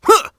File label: grunt; sonic; vc roger